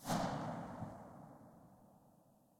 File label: silencer fn2000 very far